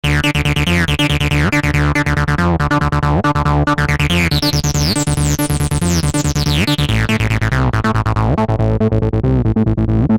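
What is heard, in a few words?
TB Acid bass, V-Synth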